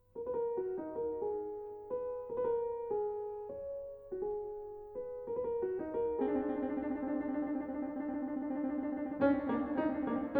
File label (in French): transcriptions pour 2 pianos; Francois Frederic Guy; Jean Efflam Bavouzet; chandos